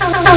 uhuh sound

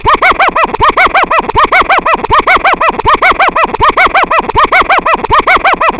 huhuh

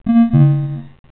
slow alert

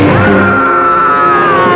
ahhhhhhhh

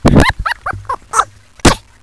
mouse laugh

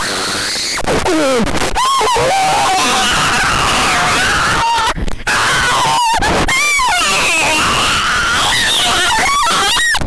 SCREE

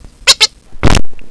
quack quack fart

